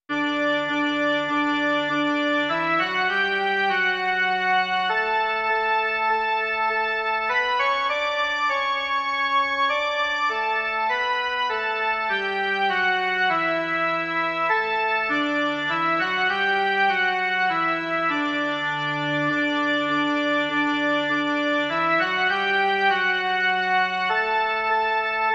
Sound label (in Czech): jen melodie; Pane, co ti nabídnout